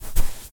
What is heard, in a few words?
footstep sand run